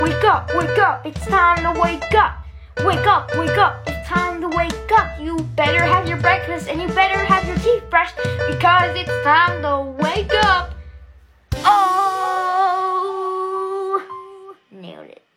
alarm song; the plush show

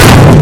hit beepteebops

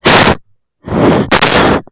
breathe on mic

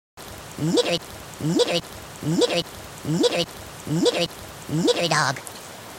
dog

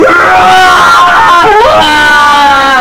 paint jumpscare sound; five nights at maggies 2

